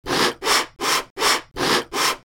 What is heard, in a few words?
Blowing the Balloon; Henry